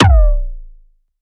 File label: laser kick